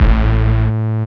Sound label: DR. LOOP, BASS; X-Static Goldmine 3